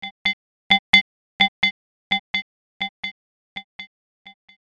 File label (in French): xylo